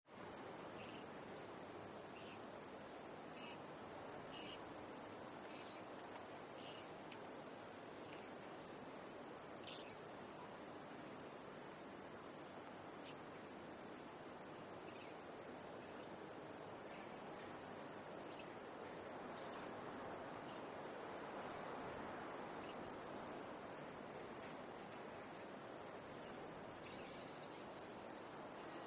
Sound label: Southern Nevada Wind, crickets